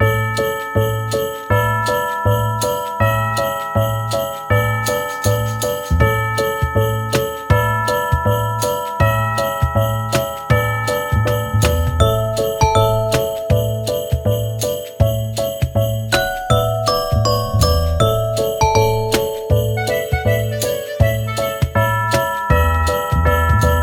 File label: happy; 【クリスマス】; Happy Holidays!; 【イメージ】クリスマス、すずの音 など